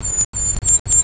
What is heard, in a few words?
golden kinglet